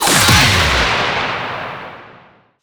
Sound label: Choir Erupt Alt Fire